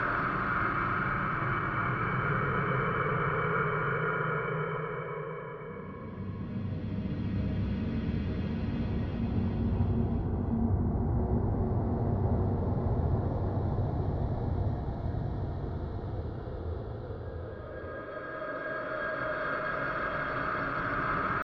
Atmo Loop

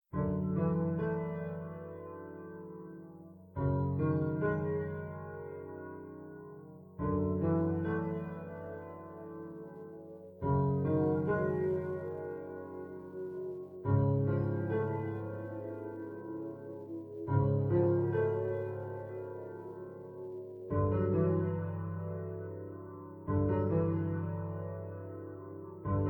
Ambient Music; Lethal Company

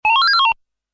Coin Credit; Dig Dug Arrangement; arcade

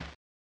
Goalie Catches Ball

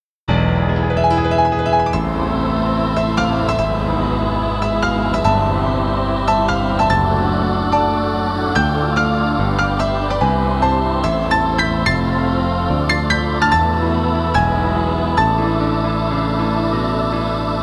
Angel Horn; Atelier Meruru The Apprentice of Arland Soundtrack; Mimi FJ Angel Horn

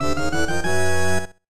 Power Shot Jingle

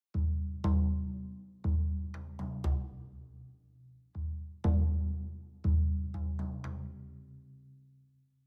Music Drums; Citadel Music Drums

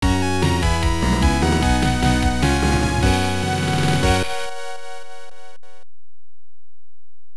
Praise (Stage Clear); final soldier turbografx 16

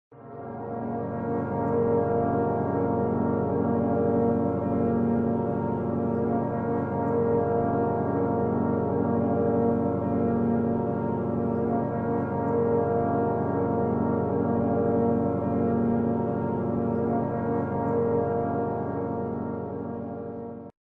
Brass; Alice: Madness Returns unreleased original soundtrack

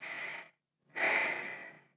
Sigh; talking tom cat 2 android ios online gamerip 2011

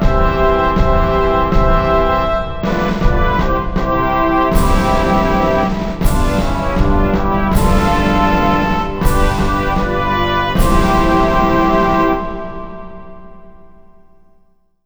Anthem Croatia; J.League Spectacle Soccer; Dreamcast

